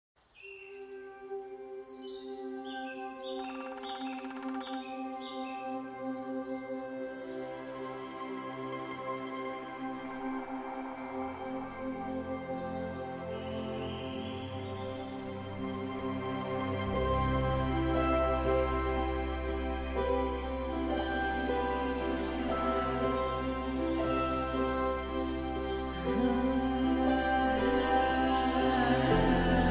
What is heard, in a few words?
Epic Orchestral Cover